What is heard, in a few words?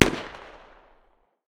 smg 45 medium distance; tacz-tweak-pack; taczmechanics